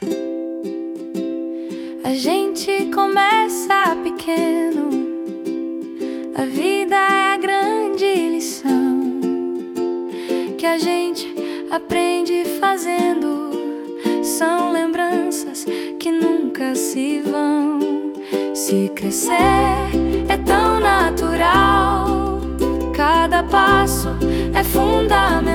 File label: jingle